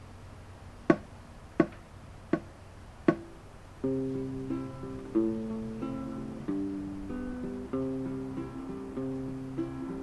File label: Patience Prayer Guitar